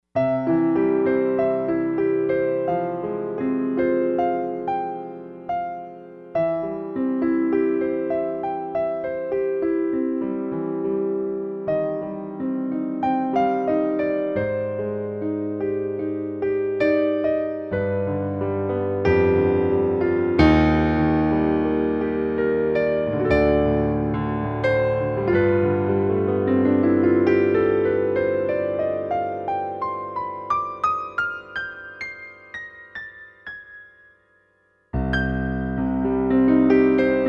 Concert Piano

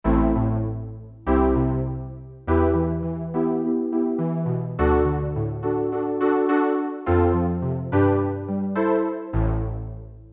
HOPPING DELAY, Boss SX-700; Factory Preset

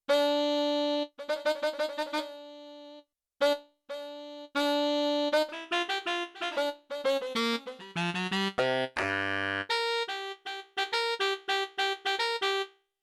Attack-Vibrato, Four Saxes, Yamaha TX16W

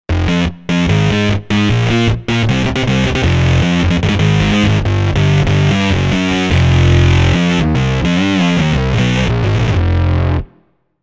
Bass Mean Bass

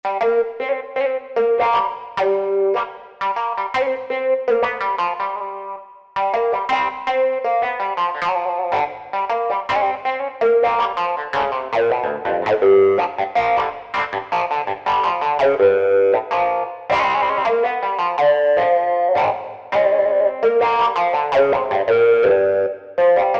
Touch Wah '70s, Korg TR-Rack

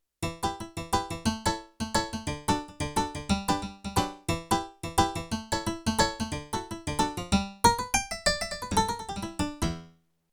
Short Harpsichord, Yamaha TX16W

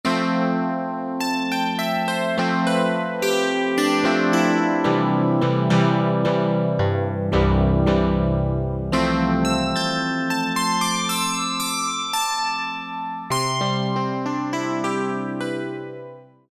AMBIENT EP, Casio VZ-10M; Internal factory combi

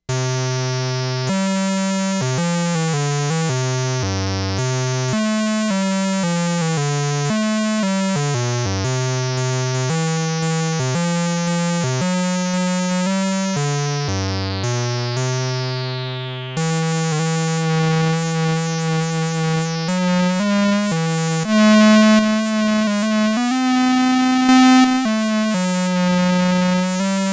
Planet, Roland SH-2000

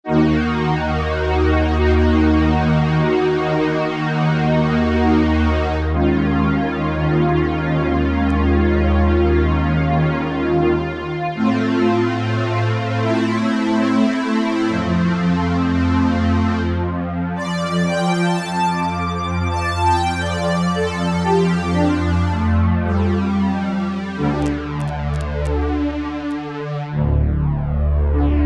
Juno Strings

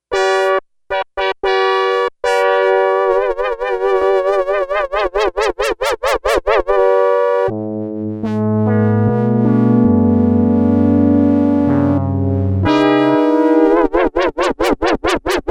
Filter Mod

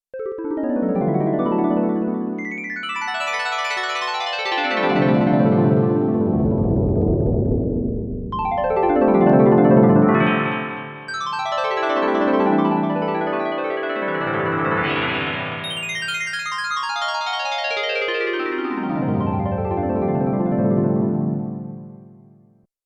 Digi Harp, Korg Radias-R

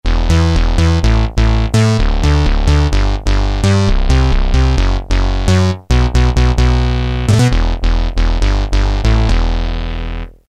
Rawsaw Bass; Roland M-DC1

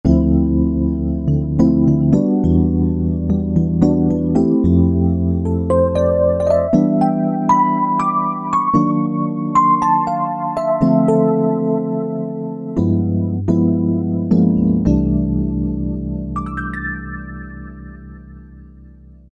Smoky EP, House Collection; Roland SR-JV80-19 House Collection

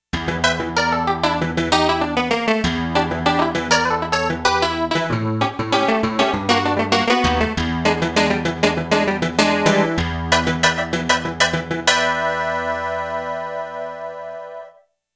SYN CLAV, Roland JX-1; Factory presets

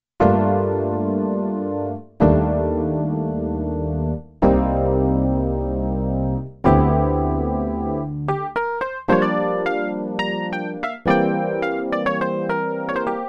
Electric Piano

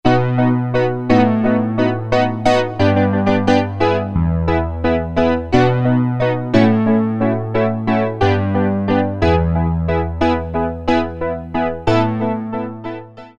E Piano, Roland MKS-50; Factory examples

